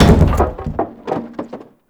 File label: Debris Metal Wood; Ambience; Gameplay; Warframe; Entrati